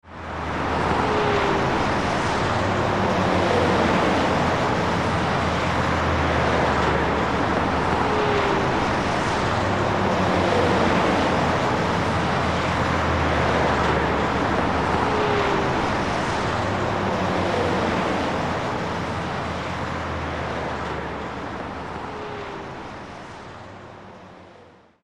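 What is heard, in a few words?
ambience hitchhike; Wario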